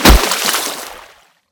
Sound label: Water splash